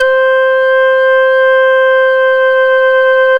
SYNTH C5, SYNTH ORGAN; Best Service Alpha Dance vol.2

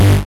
SYN Miniteck; Best Service Gigapack 1

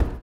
STOMPS

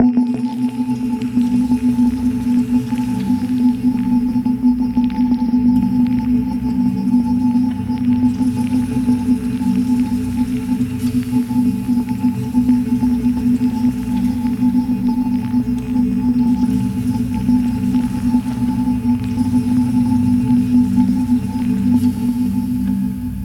Atmos; neuro; InsideInfo

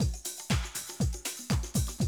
Classic Drum Loops; Best Service; XXL 1500 Classic DrumLoops; Sample CDs

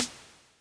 AnaShh, Electric Snare

